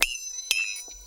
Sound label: PERC; Houseworx; Drums